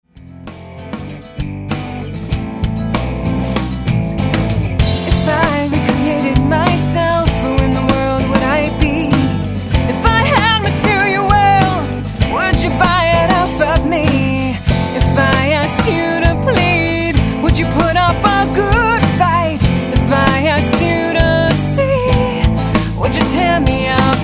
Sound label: scream